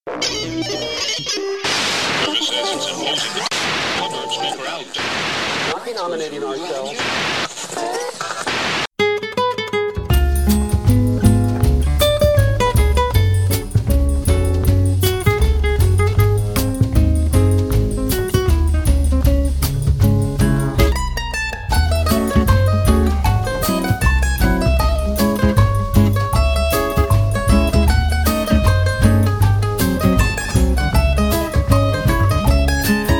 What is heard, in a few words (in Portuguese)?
TRECHO FORRO; PGTIAE 2011 - Ritmos Musicais